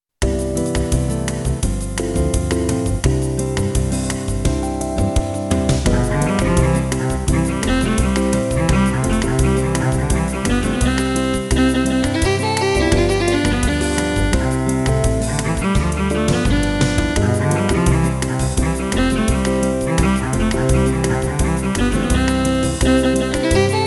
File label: Jazz